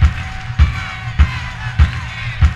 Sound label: LOOP, DRUM; E-Lab; X Static Goldmine 2; akai